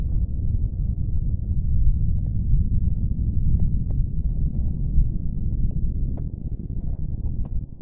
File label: lava; ambience